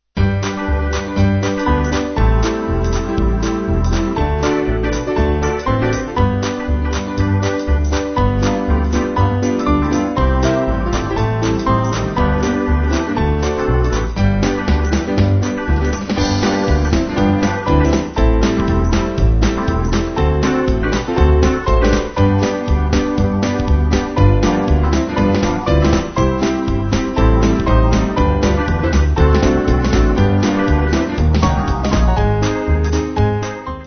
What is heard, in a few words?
Jazzy Band